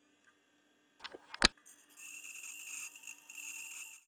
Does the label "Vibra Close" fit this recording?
No